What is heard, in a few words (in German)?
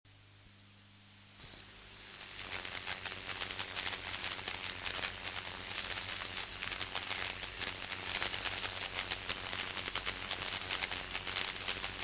Roesels Beissschrecke, Tierstimmen